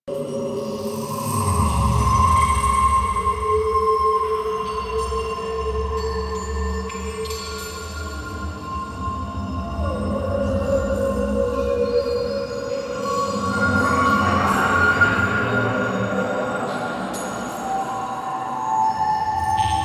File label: Ambient Loop, Ambience, Exterior; Cephalon Weave